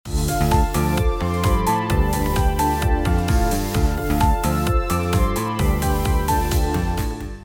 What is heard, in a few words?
loop feeling blue